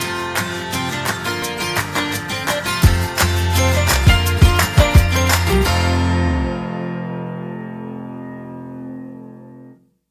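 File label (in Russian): otbivka dlya video, video jingle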